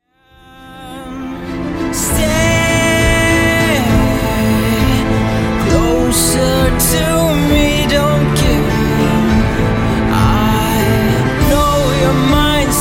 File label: Reversed